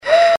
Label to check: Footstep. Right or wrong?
Wrong